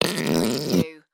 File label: Snort Throat Suction; sound effects bible